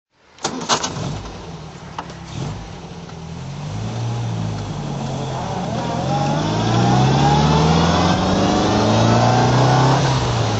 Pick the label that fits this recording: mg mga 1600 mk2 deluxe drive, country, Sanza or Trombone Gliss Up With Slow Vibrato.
mg mga 1600 mk2 deluxe drive